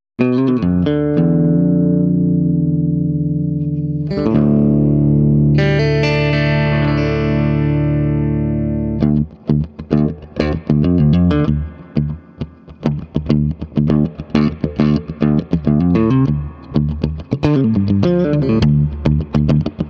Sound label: Swart Amp